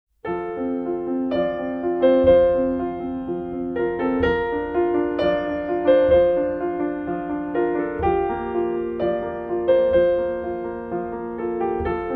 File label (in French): piano 13 ans et deux jours